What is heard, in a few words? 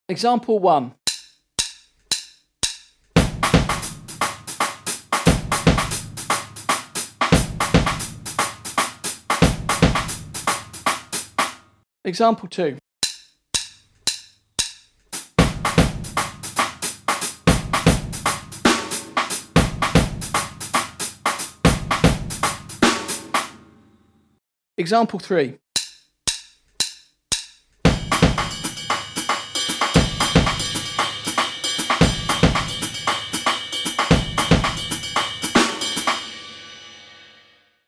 Drum set